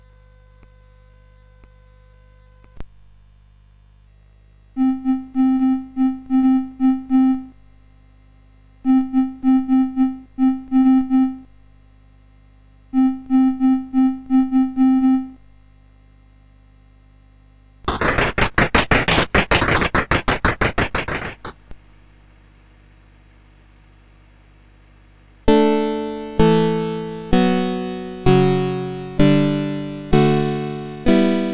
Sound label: Cannon in D in Concert, CSG8580R5